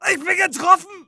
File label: hit, pain; mohaa